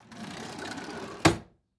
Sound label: Household Drawer Kitchen Silverware Drawer Open